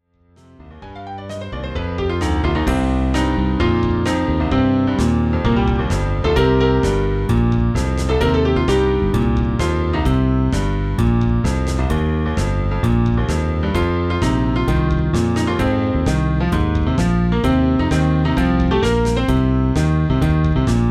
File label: Jazz Fugue